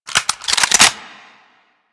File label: Clip In, Weapons; Warframe; Tenno AR